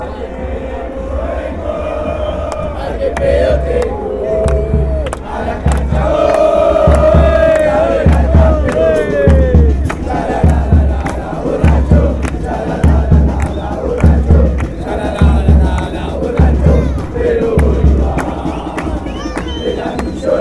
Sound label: wasted still going, chants